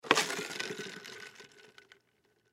Cup Pick Up Move, Cup Pick Up Ice; Pick Up Sound Effects; Foley; Objects; Blastwave

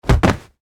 Impact Body Fall Impact; Wrestling and Struggling; Combat

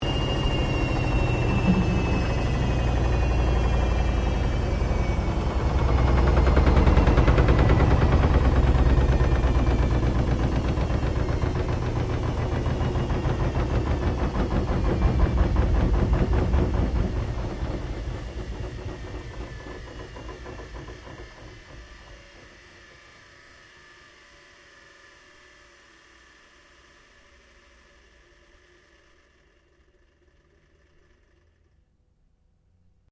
Washing Machine LG Frontloader Shutdown; Household Appliances; Laundry (Sound Effects:Household)

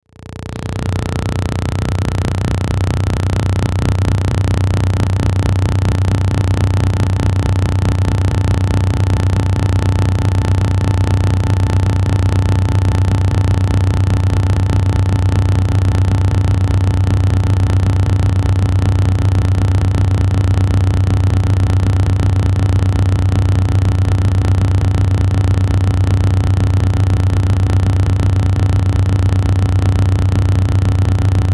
Drone Pulse Chopper Static Reverb; Blastwave

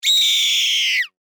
Animal Hawk Screech Red Tail; Birds; Animals

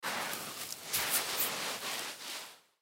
Sand Movement; Sand Hand Sandcastle; Playground; Backgrounds; Foley; Playgrounds; SoundEffects-Blastwave

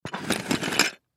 Toy Move Objects Slide Stack Pile, Plastic; Miscellaneous Cartoon

